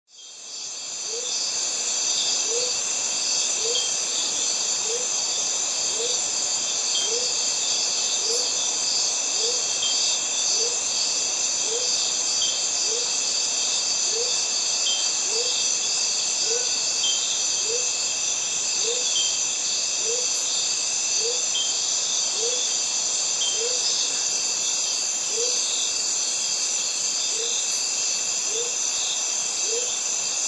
Cicadas and Birds in mediterranean forest

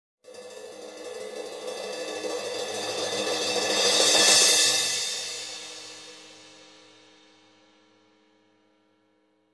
Drum crash crescendo

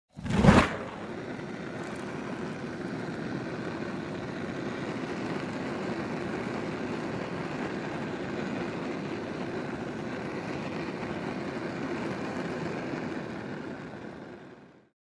Leaking gas catches fire

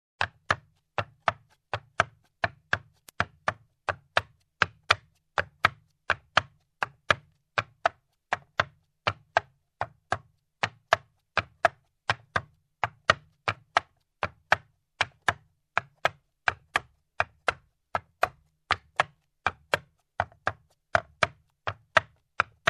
Horse Gaits on a wood surface

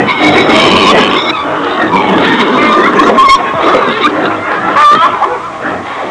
Pigs and chickens sounds

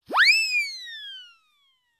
Cartoon Spin

